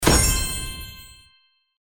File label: boom; spinup main